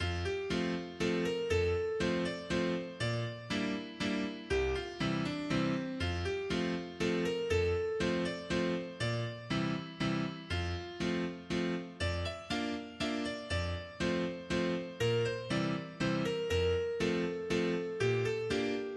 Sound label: Zither Carol; Zither Carol F